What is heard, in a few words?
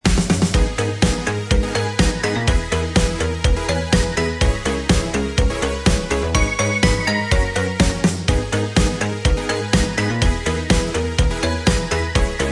Vocal Remix